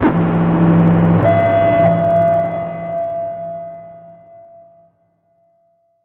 alarm slow

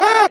aahh; the rock

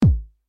909 kick drum

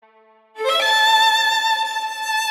violin

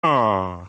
aww; peter griffin

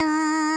auh medium

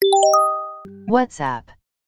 nokia ringstone

